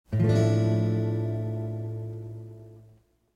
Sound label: d min9 over a